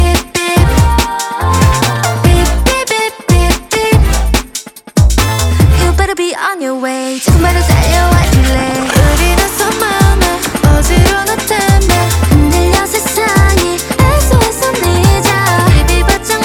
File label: BEEP